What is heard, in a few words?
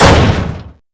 dynamic; p4u; aigis